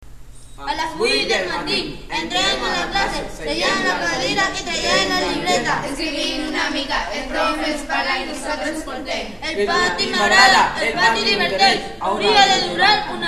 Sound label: RAP; TERRASSA